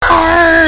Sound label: screaming